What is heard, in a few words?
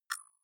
click rough low